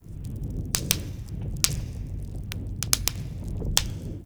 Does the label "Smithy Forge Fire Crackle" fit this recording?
Yes